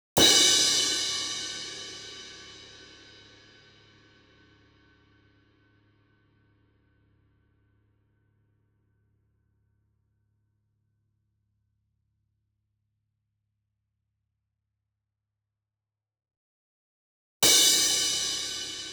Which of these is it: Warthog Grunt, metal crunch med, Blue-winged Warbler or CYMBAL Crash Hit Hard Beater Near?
CYMBAL Crash Hit Hard Beater Near